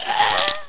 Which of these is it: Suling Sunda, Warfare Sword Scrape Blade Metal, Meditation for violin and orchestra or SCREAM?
SCREAM